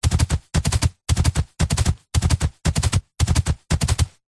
animal horse running galloping grass

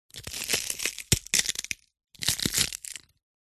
horror bone break crush

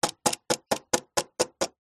leisure toy plastic figure walk movements

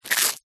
cartoon bite eat

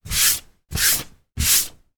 household hand brushes dust off of table

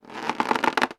foley balloon rubbing squeak; sound effects one

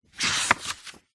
household book diary page turn

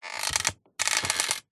metal frame movement creak; foley

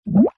cartoon bubble; zapsplat

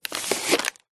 foley packet baby wipes pull out single wipe; zapsplat

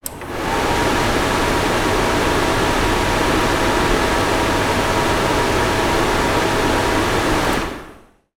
industrial air curtain machine on run off

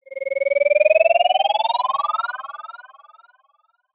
cartoon grow

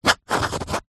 office pen rollerball sign siganture on card

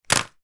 small wrapped chocolate bar drop on table

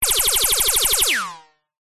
multimedia game sound laser gun fire high pitched

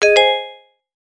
app alert tone

